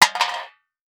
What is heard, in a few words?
Household Pan Tin Drop On Cement